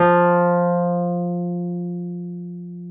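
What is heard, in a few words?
Wurlitzer electric piano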